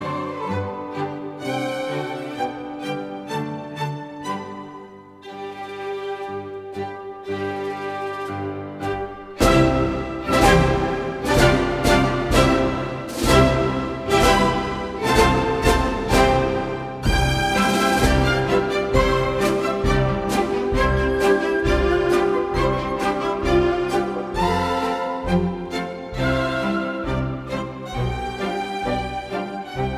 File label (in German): marsch